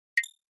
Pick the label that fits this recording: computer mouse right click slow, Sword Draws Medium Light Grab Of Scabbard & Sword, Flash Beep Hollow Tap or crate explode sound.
Flash Beep Hollow Tap